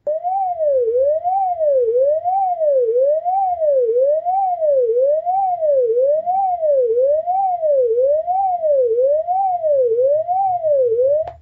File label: Bogen Siren